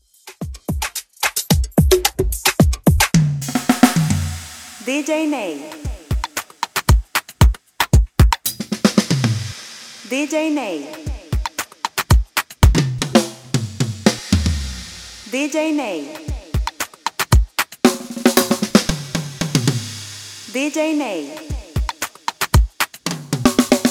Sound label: FILLS REPARTO